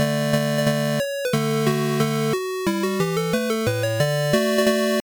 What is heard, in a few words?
Level Completed; antarctic adventure; colecovision master system